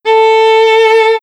Violin vib A5; dsp2008 sound library

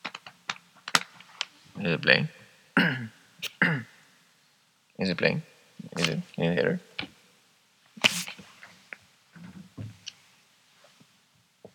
Whistling

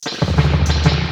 drums all spring

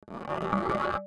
wood

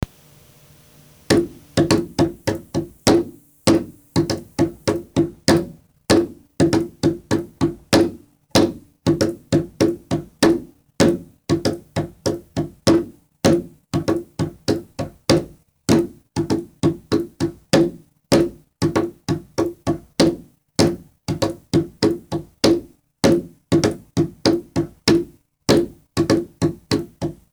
drums

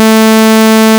sawtooth

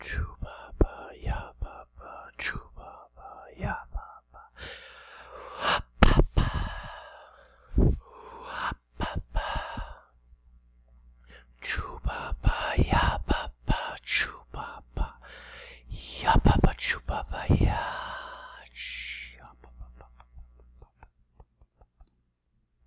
choo pa pa

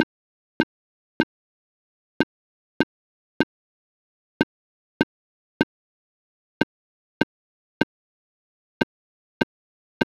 Clarinet vs Violin

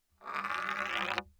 glass High Acc Medium

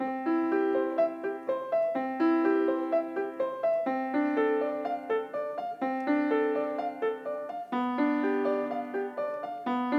prelude cmaj

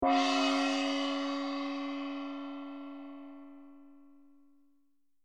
gong

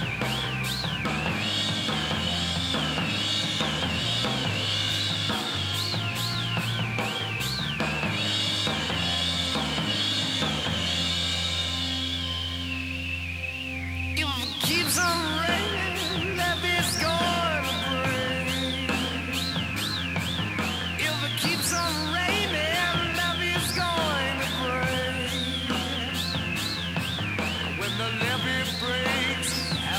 rhythm

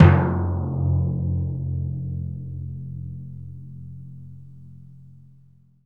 timpani

